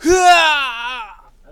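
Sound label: death, male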